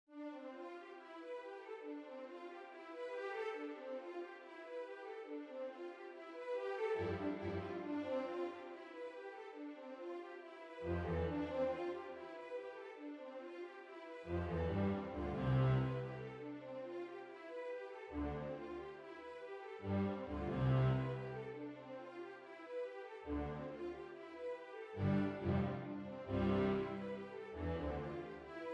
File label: string orchestra; Rainmkers